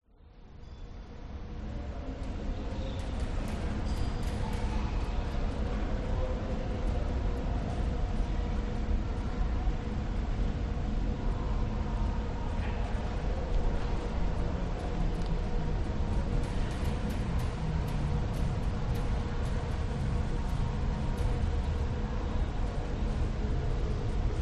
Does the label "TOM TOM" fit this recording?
No